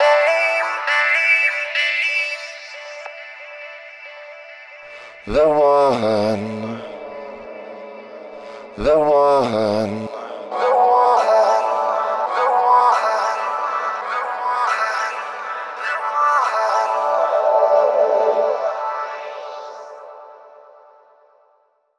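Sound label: background vocal loop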